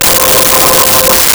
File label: Space Voice C5; Nintendo; July 2020 Gigaleak